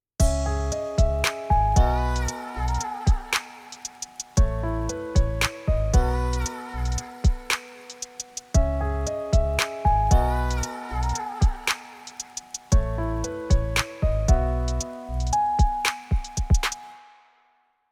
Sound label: master Off